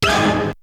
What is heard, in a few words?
Hit; ZERO G - Datafile DISC 1